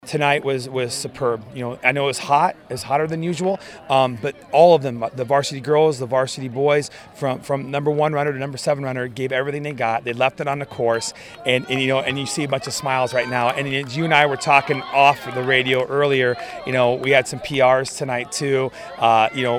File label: Waltz